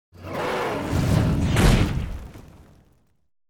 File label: hellhound atk